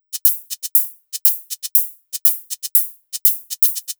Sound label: 120 Analogue Hats; Zero-G; Soundclash Nu Dance Electro; Combi Loops; 120bpm